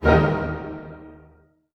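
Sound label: D# major pianissimo, orchestra hits; Sound On Sound - Peter Siedleczek's Orchestra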